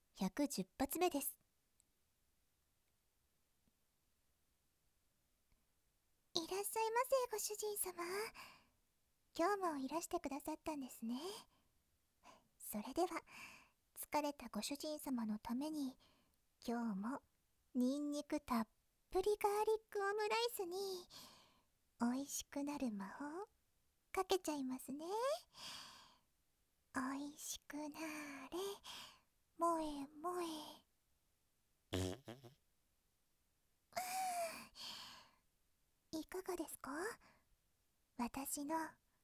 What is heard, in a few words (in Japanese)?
放屁音実演音声; 本編使用放屁音実演音声集